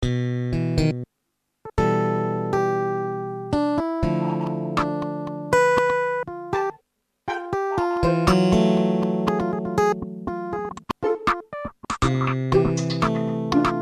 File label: PIANO